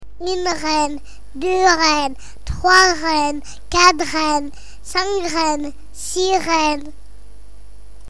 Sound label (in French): sirene